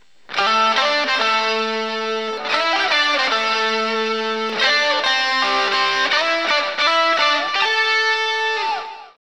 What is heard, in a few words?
gtr